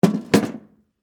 Tool Box Set Down, Industry, Tool, Box, Set, Down, Metal, Case, Antique; Hand Tools; BlastWave